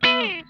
COOL HIT, Funk Guitar; Zero G - Funk Guitar

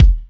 Kick, Kickdrum; Equipped Music; Loungin House

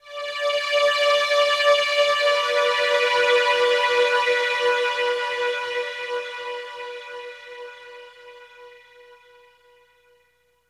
Pad String, Drum & Bass Pads; Sonic Foundry (Sony Creative Software); Knutrix Drum & Bass

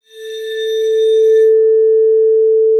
ARCO A4, VIBES; Xsample VOL-4

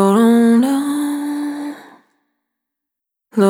Spin, Single Voice Melodic Loops

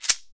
SHEKE, Afro Shakers, Shekeres

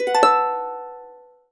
HC B MIN 11, Harps; USB Soundscan vol.62; AKAI

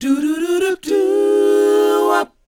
DOWOP A#, Vocal R&B DOO WOP; ILIO; Vocal Planet

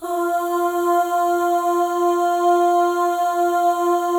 OH, FEM, Symphony of Voices; Spectrasonics

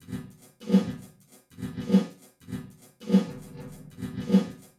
Noise Beat; Drums & Drones

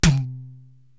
TOM Hi Vox, Korg TR-Rack

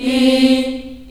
C3, CHILD, Choir; CHILD IHS

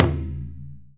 DAIKOKU, JAPANESE DRUM; AKAI S6000 CD-ROM - Volume 1; PERCUSSION

